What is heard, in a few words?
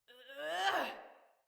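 Exertion Female; Battle Barks; Envoy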